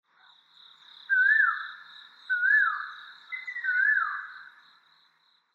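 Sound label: Asian Koel; オニカッコウ; さえずり; 鹿児島県奄美大島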